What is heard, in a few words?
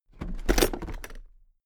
toolbox open heavy